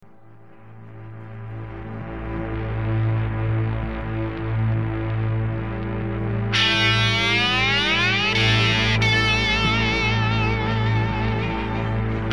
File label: slide solo excerpt